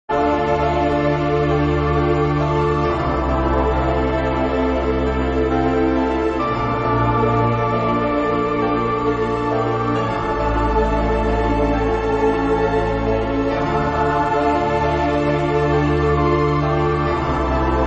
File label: Symphonic Piano Remix; Giana Sisters